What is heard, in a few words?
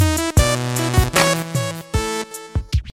HipHop; Cherry Mobile